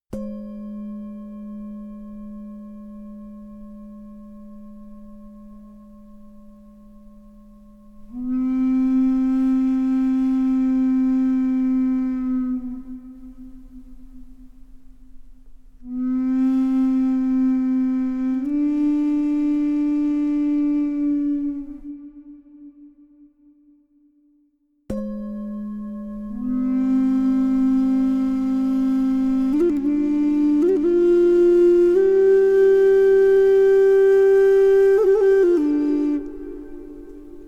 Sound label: Tibetan Meditation Music